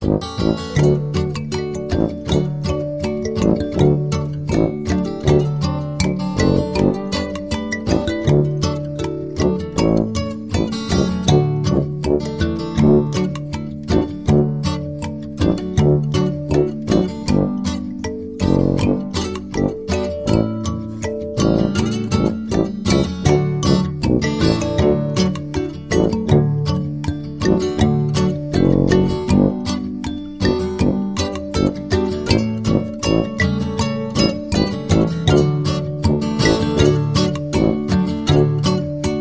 Jingle Bell Jump; Trad (PD)

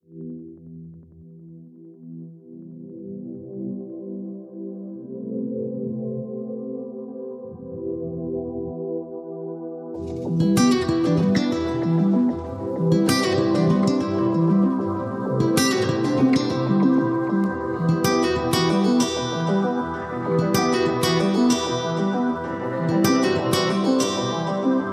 Guitary Mix

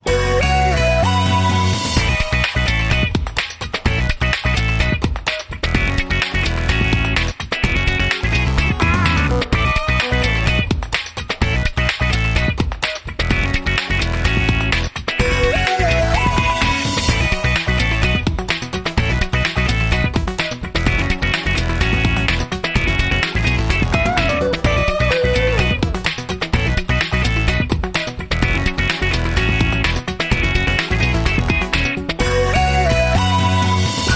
World View Promo

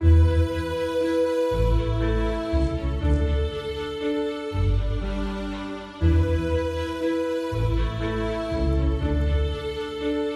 Underscore

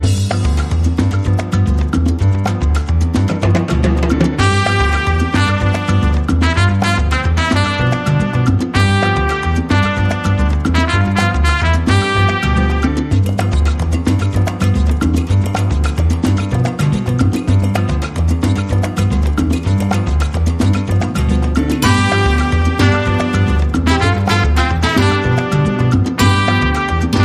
Midnight Rhumba

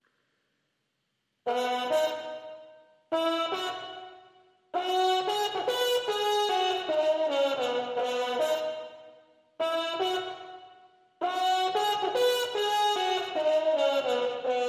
Trumpets Clean

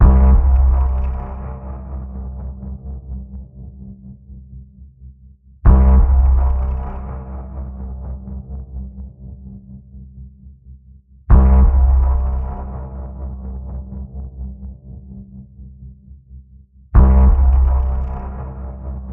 Danger Pulse C, Key of C

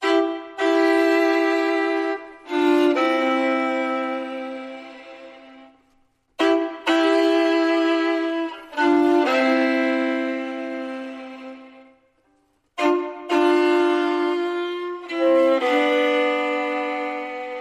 Loping Along, Fiddle

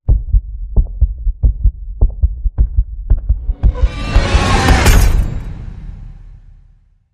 Heartbeat Hit

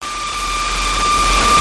glitch

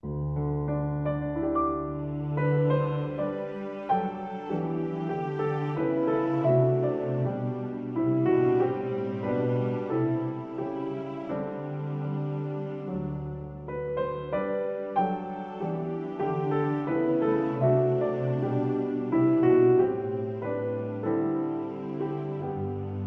With Strings

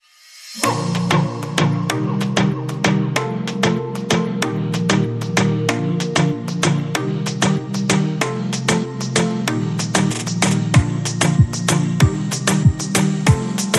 Caribean Flute Rhythm

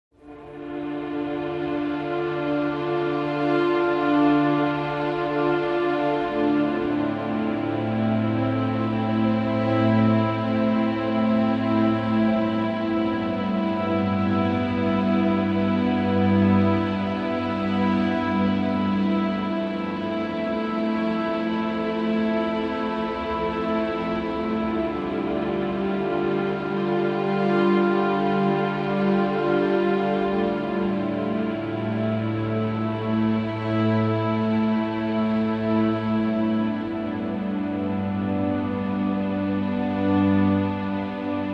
Legato Strings

